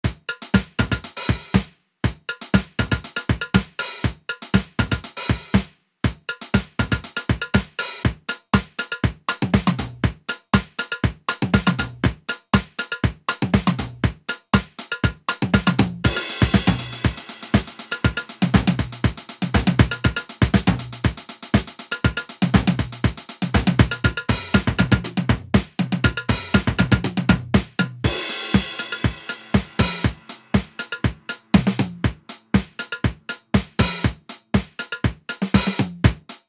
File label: Disco Kit; noiiz